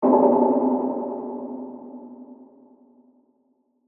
Bell Muted Reverb, Production, Element, Bell, Muted, Reverb; Bells; Musical